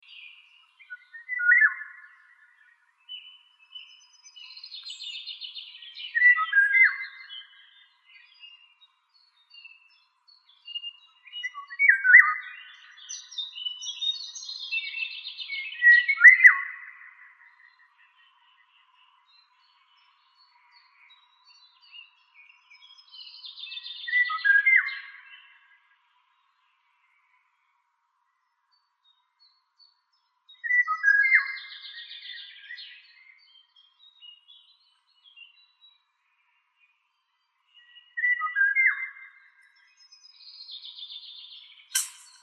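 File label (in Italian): Rigogolo, Canto degli uccelli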